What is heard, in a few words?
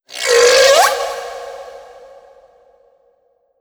Critter Female Verb; Kahlo